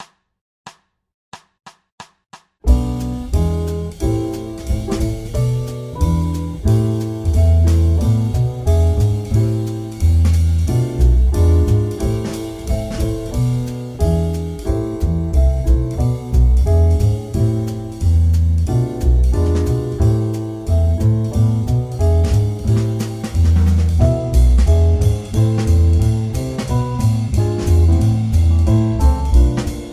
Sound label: Bm7b5 - E7 - Am7 (2 bars Loop) - 180